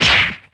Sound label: punch short; Fight Knight